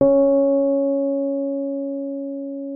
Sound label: RHODES MK1M C#4; Xsample VOL-1